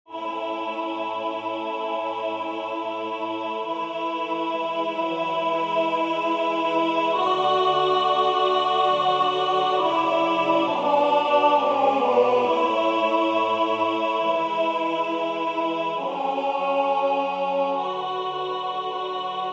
alto; O Crux ave

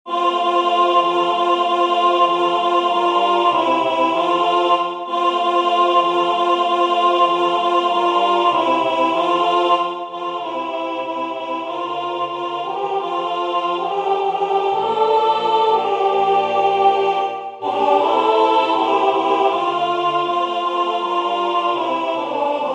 alto; Venez mes enfants

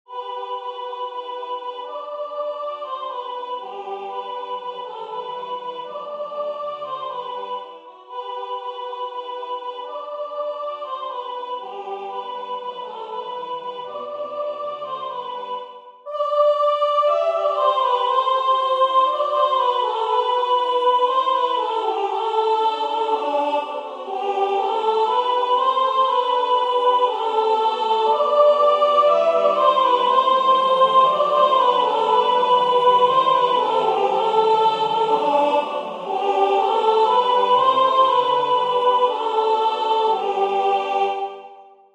soprane; Les anges dans nos campagnes; Gevaert

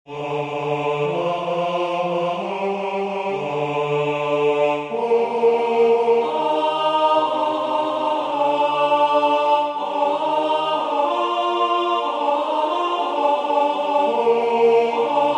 L'Esprit de Dieu repose sur moi tenor

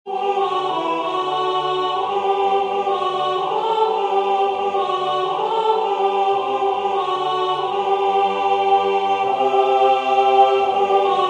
alto; Regina coeli; Aichinger

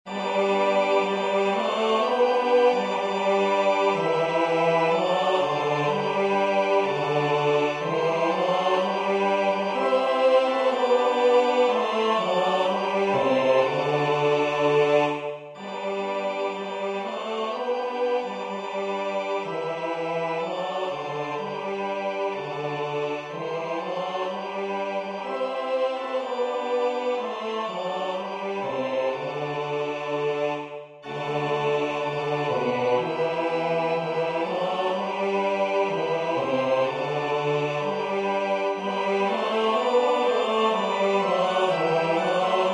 basse; Tollite hostias

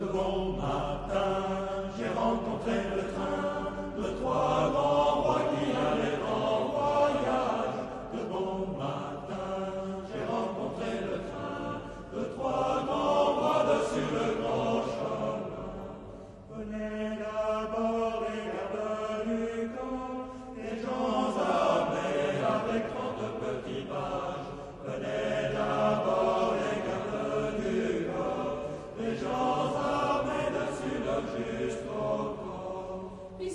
La marche des rois chorale; Gaudete; Notre Dame des Champs

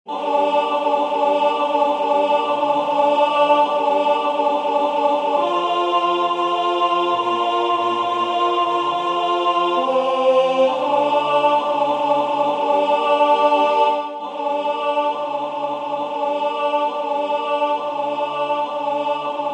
alto; Viens Esprit de sainteté

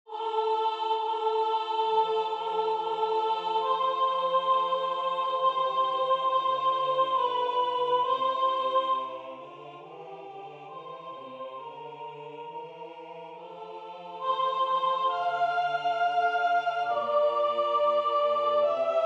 soprane; Constitues eos; Ravanello

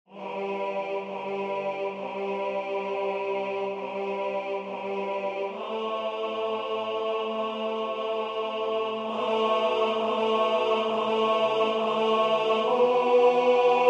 Je vous aime o mon Dieu tenor

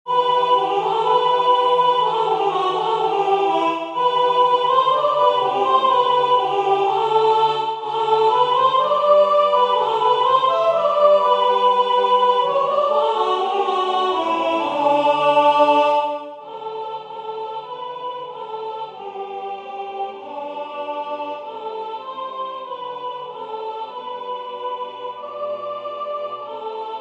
soprane

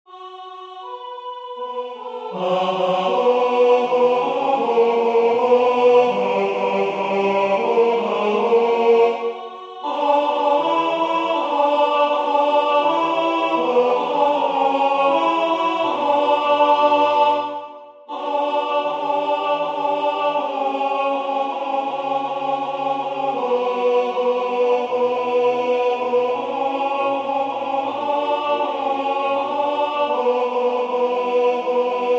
Maintenant Seigneur tenor

